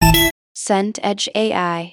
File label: notify urgent